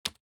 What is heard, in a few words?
key